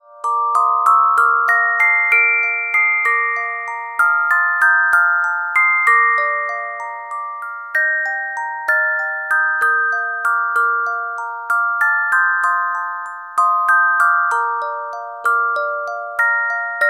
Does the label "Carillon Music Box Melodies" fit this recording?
Yes